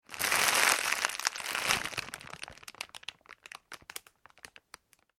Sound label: Plastic Packaging Movement; Plastic Packet / Packaging Movement; Materials; foley; McKinney Sound